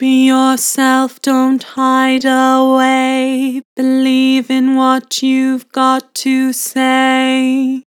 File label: Vibrato, Vocals; Be yourself; Harmor Vocals